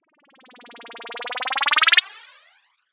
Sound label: Breakdown FX, Underground UK House & Electro; Sonic Foundry (Sony Creative Software)